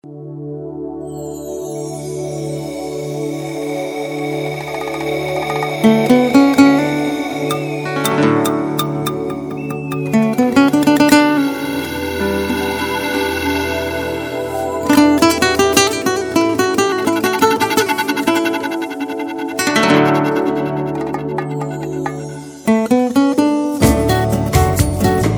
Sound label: Bossa Nova